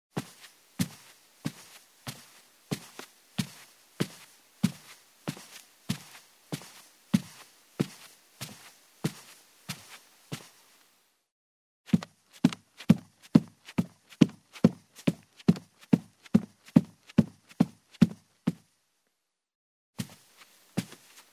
Barefoot steps on a wooden staircase covered with carpet